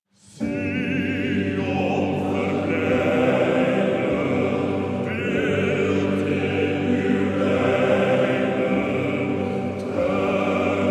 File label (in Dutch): Sint-Niklaasmannenkoor, piano